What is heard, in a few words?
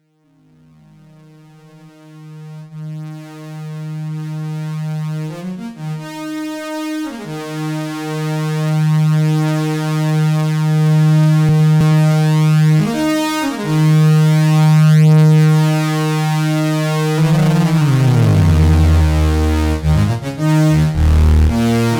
Waldorf Quantum Classic Analog